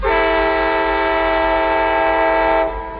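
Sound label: Teplovoz sound, diesel locomotive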